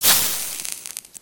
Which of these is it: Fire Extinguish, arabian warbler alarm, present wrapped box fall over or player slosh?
Fire Extinguish